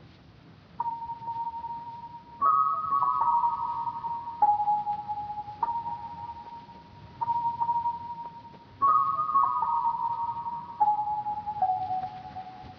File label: Lofi Melody Loop - 75 BPM G# Min Pluck; Cymatics; Lofi Starter Pack; Eternity